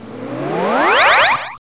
TAKEOFF, SPACE

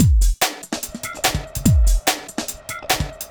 cracker 145

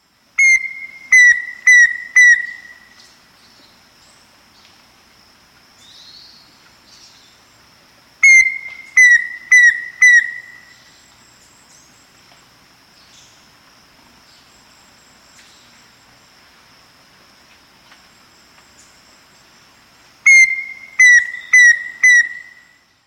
Black-Faced Antthrush; S. America

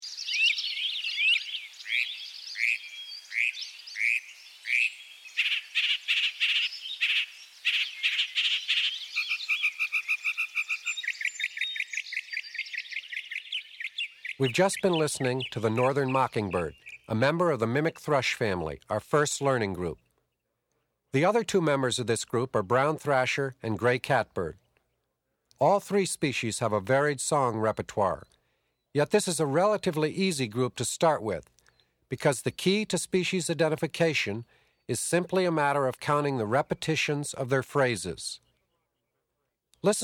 Mimics Northern Mockingbird; N. America; Eastern and Central North America; Birding by Ear; Peterson Guides

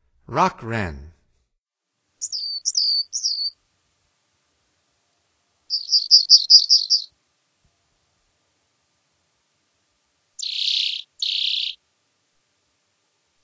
Rock Wren, Songs of Birds of North America; Stokes

